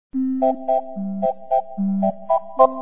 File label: close; MRSHot2003